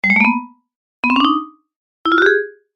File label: ascending vibraphone sounds